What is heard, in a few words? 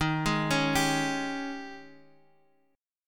Ab Major 7th Suspended 4th Eb